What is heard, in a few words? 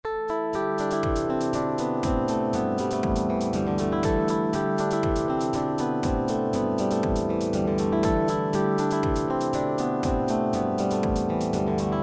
Piano Test